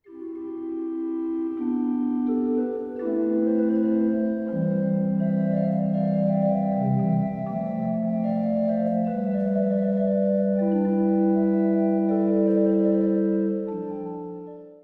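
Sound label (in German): Adagio Suite C Dur, Orgel; Gottlieb Muffat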